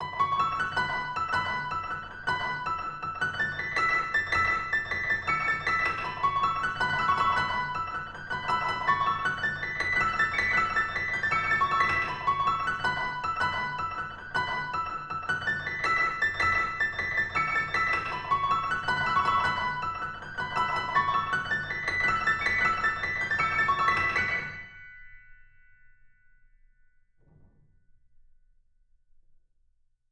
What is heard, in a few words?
Melancholy piano HI